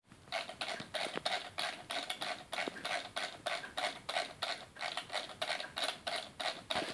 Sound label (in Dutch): geluid muis